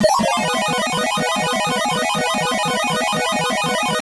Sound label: Retro Video Game FX; Glitchedtones